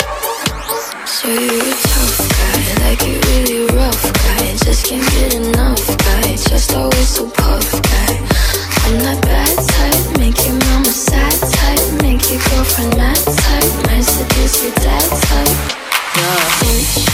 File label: 130 bpm Gm